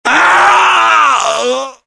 death; hl2dm

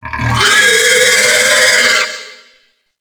wretch tunnels sight; A Machine for Pigs

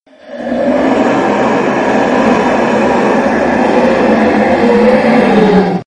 death; freak fortress 2; nightmare

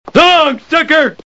death; hl2dm

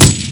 shoot; tf; lucy

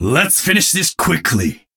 battlecry; genji

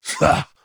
laugh; garrosh

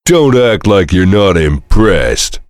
heavy laugh happy; tf; duke

